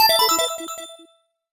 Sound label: Get Collect Coin; SM3DL